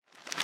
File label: Footstep Fertilizer Rev